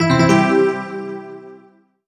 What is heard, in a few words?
Solo EPiano Positive; MUSIC EFFECT; startide